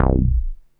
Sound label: NASTY BASS